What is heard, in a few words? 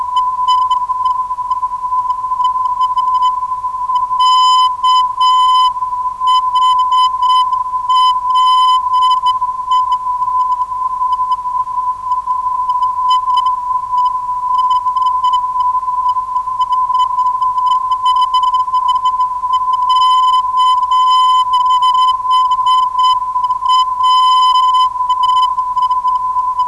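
non-directional beacon; KHR; 327kHz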